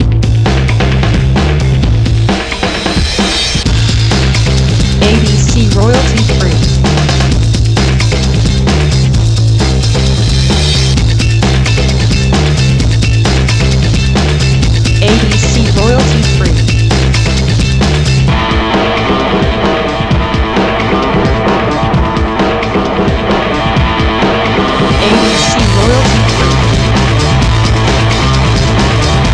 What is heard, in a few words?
action breakbeat lo-fi